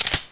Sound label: fiveseven slide release, weapons; svencoop